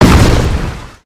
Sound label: rock spawn; creatures organic